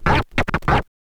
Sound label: scratch kit, vinyl